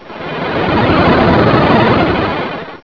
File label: ENTER